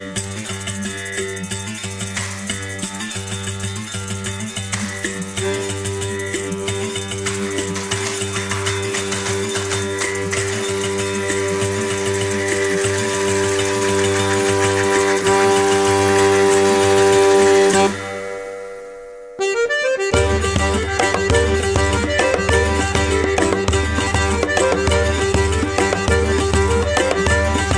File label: Fife And Drum